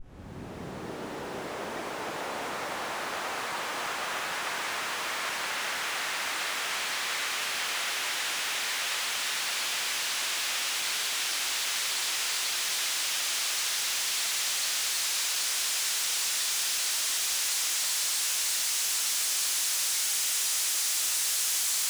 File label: noise bandpass